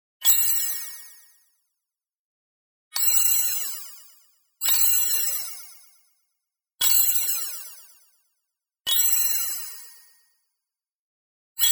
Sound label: bling, tonal color high; boom library; Anime Essentials; DSGNMisc